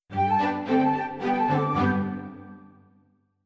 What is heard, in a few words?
sting sculpt brilliant; The Sims 3; Windows